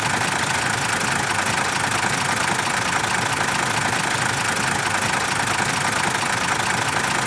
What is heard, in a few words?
Engine bus old small